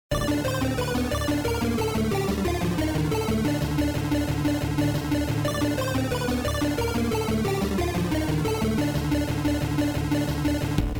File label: Theme (8-Bit); American Arcadia Original Game Soundtrack; Spectrex Theme